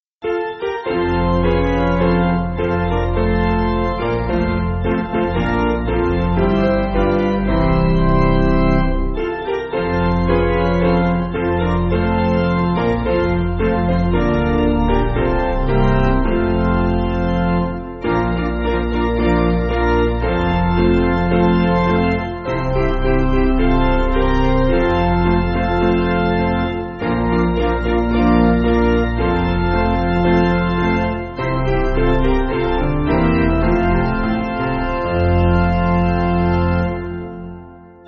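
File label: Piano, Music Backing Files for Churches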